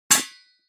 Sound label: Impact Bowl Metal Hit By Small Metal Pipe